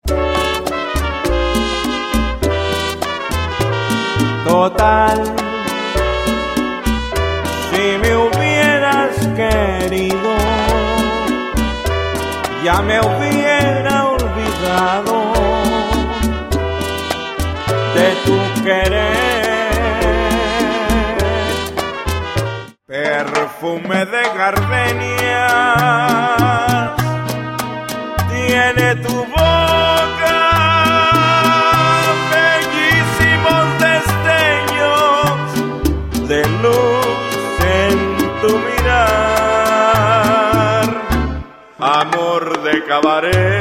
Latin Band, boleros tropicales

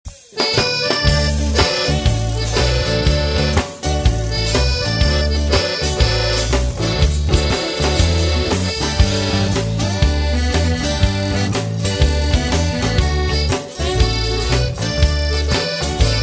Cajun Band

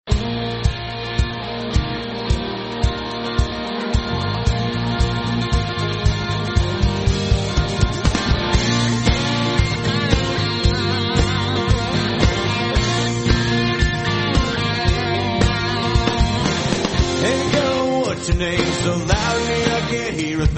Country Band

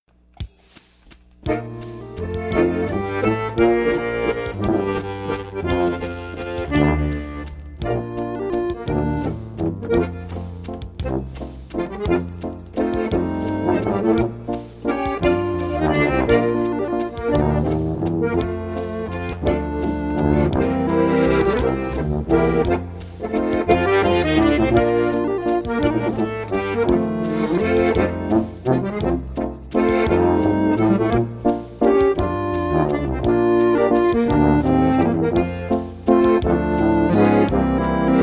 Polka Band; Denver

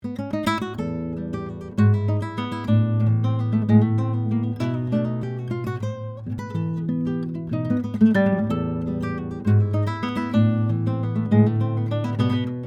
Classical Guitarist; Chicago Classical Guitarist

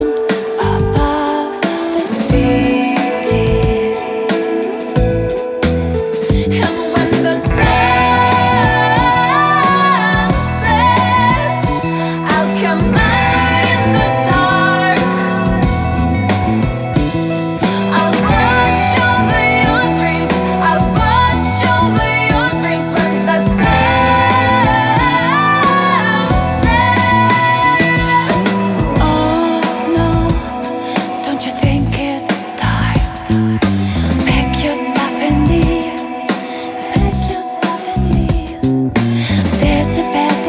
Alternative Rock Band; London

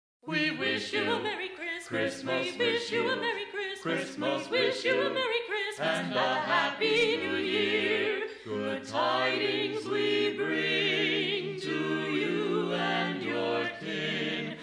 Carolers; Boston; We Wish you a Merry Christmas

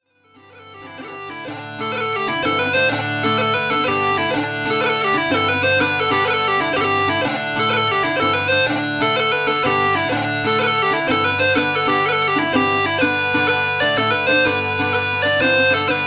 Irish Singer; Austin Irish Singer

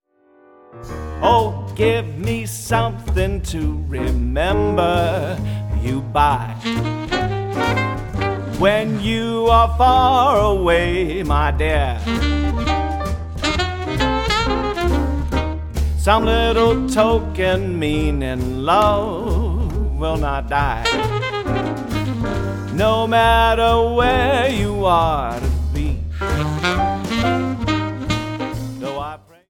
Jazz Band; Pittsburgh

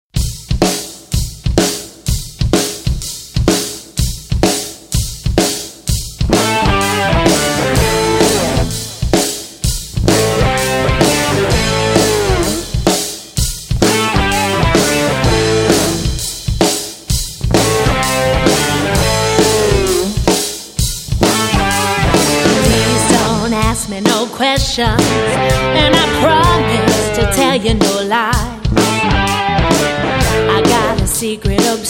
Blues Band

